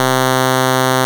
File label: SQR C3, Vintage Keyboards; Big Fish Audio; First Call